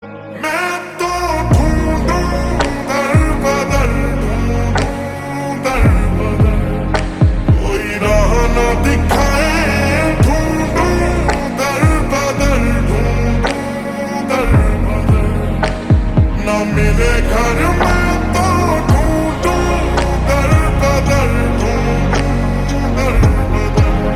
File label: Ringtone Slowed Reverb